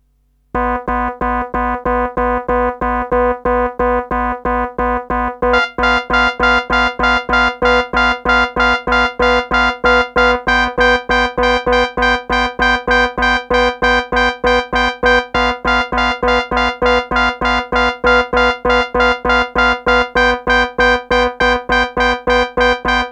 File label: alarm, Looping Ringtones Played on Yamaha Pss-380; Mehmet Emre Doğan